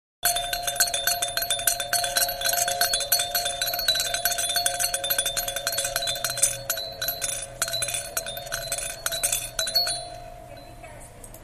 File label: Wobble ball egg